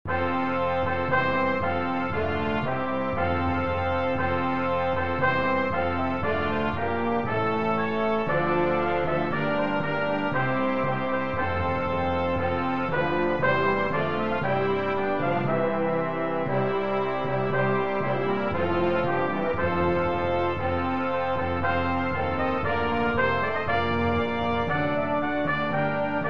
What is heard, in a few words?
Hymn